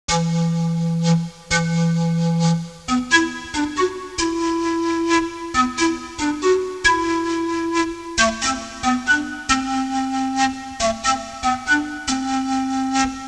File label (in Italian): musica strumentale